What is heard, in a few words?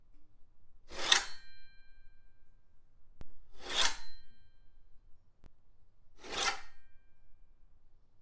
Pull Or Sharp A Sword Or Knife